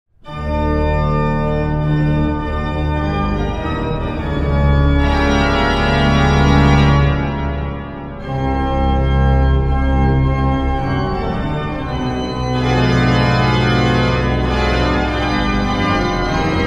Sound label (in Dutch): fantasie en fuga; over psalm; Vincent de Vries Kampen